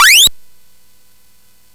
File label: sid CSG8580R5, GAMES; Dragons of Flame